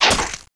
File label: ballista hit person; Caesar III